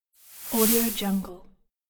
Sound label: stereo air sparkling whoosh; kaelv